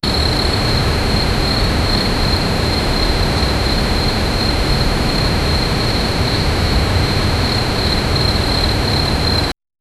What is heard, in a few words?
a calm night atmosphere